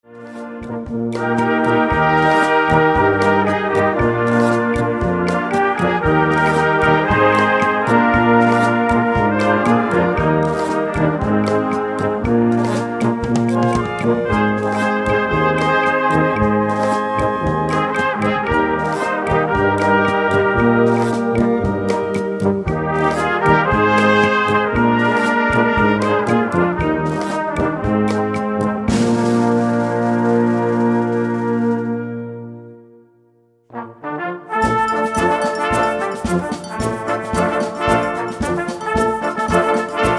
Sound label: Latin Music Medley